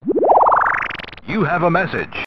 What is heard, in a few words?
Robomessage